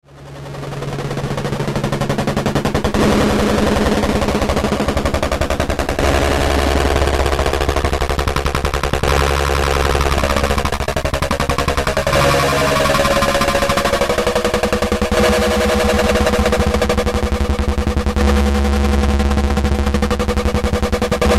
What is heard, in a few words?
pulses jumpin